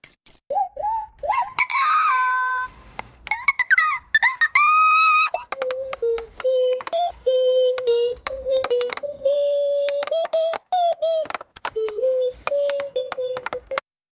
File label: create a funky sound for, interactive